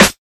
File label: perfect steel snare drum sound c key